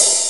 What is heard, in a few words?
Open High Hat, Yamaha PS-55 drums